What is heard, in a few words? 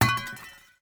glass hit; teardown; ps5 windows xbox series xs